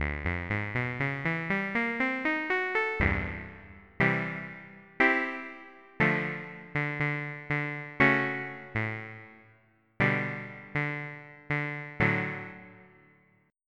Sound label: Syn Bass; Onj's Soundfont